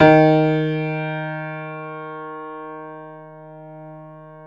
PIANO D#3, STEINWAY; Greytsounds - Philip Wolfe David Crozier's Saxophones; GR8 STEINWAY

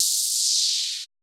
perc, Roland MC-909

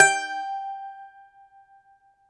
DOMRA

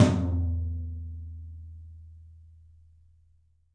13 TOM, 3D Drums; 3D Sound

